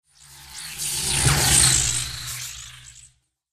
Sci Fi Energy Beam Pass By Zap Electricity Bolt; Laser; Lasers

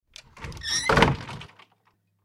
Door Close Screen, Door Close Screen Squeak Wood; Screen Doors; Household; Blastwave

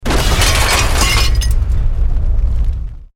EXPLOSION MEDIUM METAL GLASS

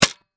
Nerf Rifle Shoot; Foley; Dart, Fire, Toy, Gun; Toy Gun Sound Effects

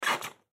Squeak Wood Rub; Foley; Squeak Sound Effects; Objects; Blastwave

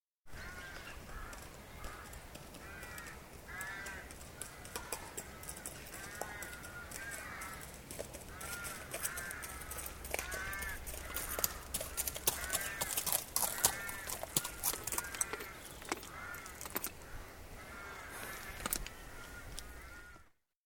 Horse approaches & stops on gravel; Horses; Animals; Horses Walking; BBC

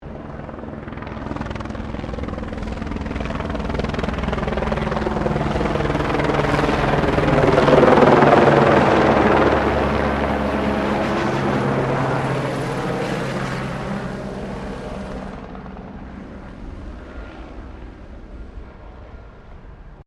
HELICOPTER BELL 204 FIREFIGHTER PASSING CLOSE; Helicopters; Sound Effects:Vehicles

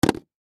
Gift Box Full Drop Cement; Drops (Sound Effects:Objects); Foley; Blastwave

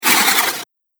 Ghostly Mover, horror sound effect; Ghost Sound Effects; Andrew Fleming

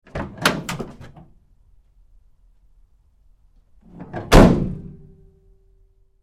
Truck GMC Savana Door 2nd Side Open Close Hard; Trucks; 2010 GMC Savana; Big Room Sound

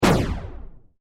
Science Fiction Laser Gun Single Shot; Lasers

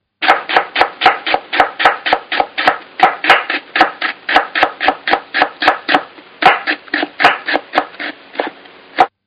Knife Chopping, Food Prep; Restaurants

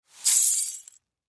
Broom Sweep, Broom Sweep Up Broken Glass Fast; Cleaning; Household; Blastwave

